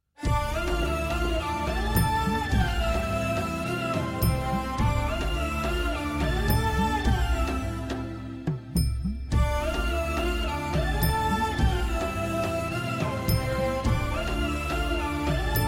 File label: Puthiya Geethai Bgm